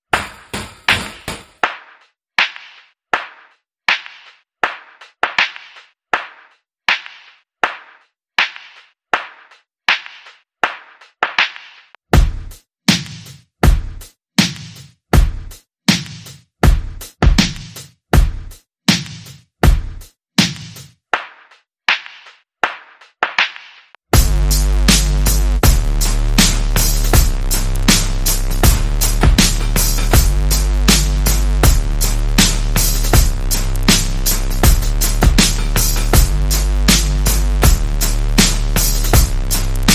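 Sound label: Bass and Percussion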